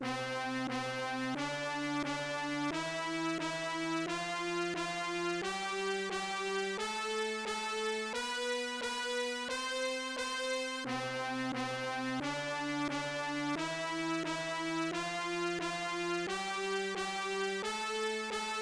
Sound Scale Check; arcade; Run and Gun

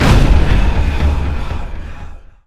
God Shockwave; Huehuecoyotl Closer

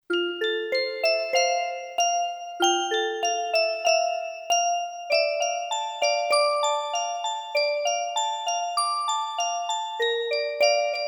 Orgel; Ojousama Kumikyoku original soundtrack

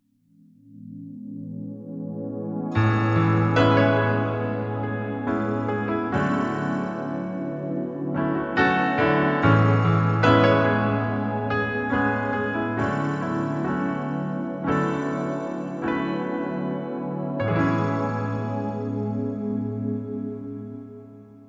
The Heart Beats

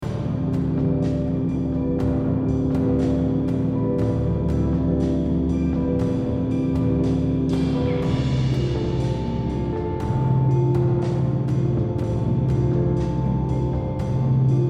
World Loop

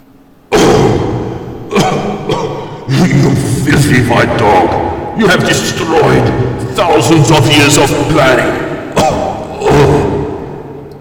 Die

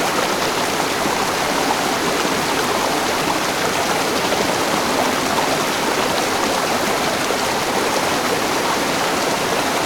stream heavy flow; Level1 Ice Cliffs

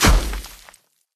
Frosty Explodes; Hero Eiskalt

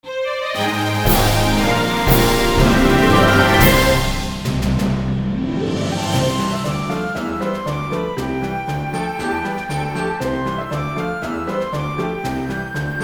Victory Long; Aeternum Quest

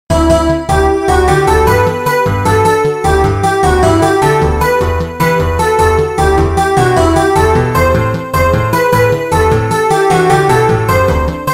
Wi-Fi Menu; mario kart zero hack ds

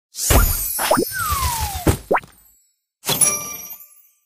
Popup; candy crush saga gamerip

